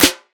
smooth acoustic snare sample D key, steel snare drum sound tuned to the D note